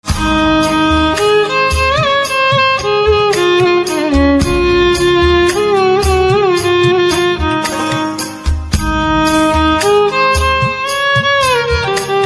Violin Instrumental Ringtone

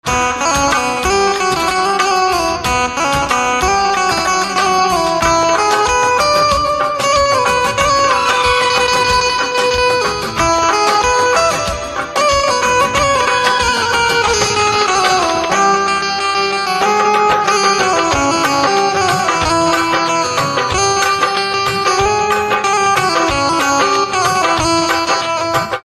Banjo Instrumental Ringtone

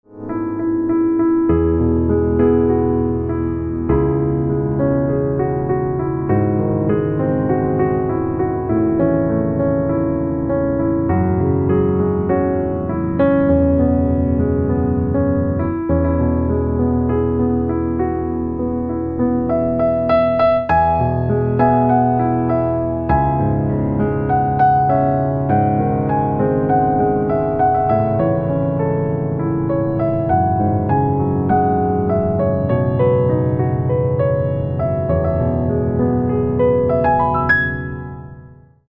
Silent Piano Instrumental Ringtone

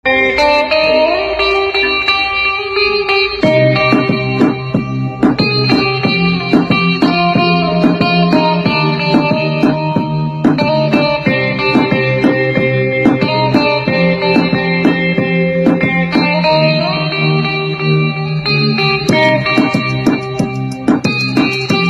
Sitar Instrumental Ringtone

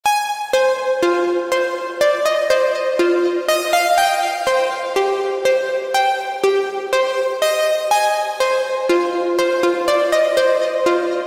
Technology Guitar Ringtone

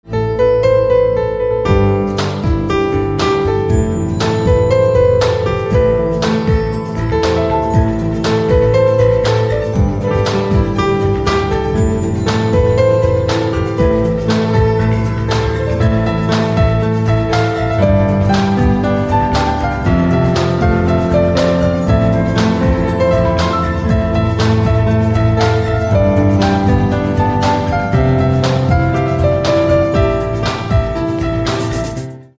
Piano Instrumental Ringtone

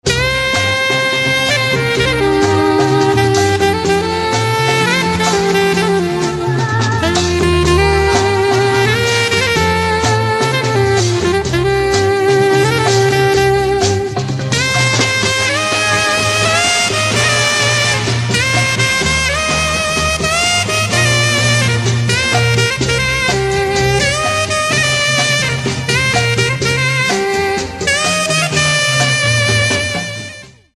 Saxophone

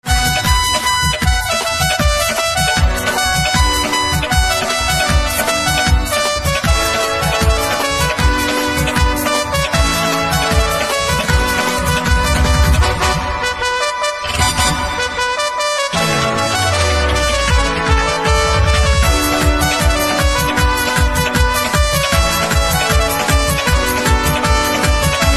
Saxophone Instrumental Ringtone; bhojpuri instrumental ringtone

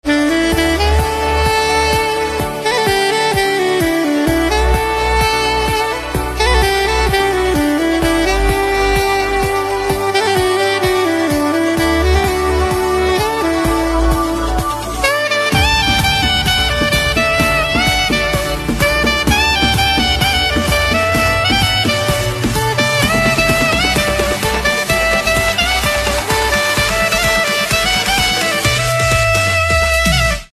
Saxophone Instrumental Ringtone

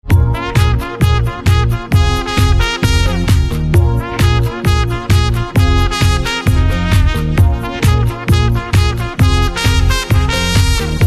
Saxophone

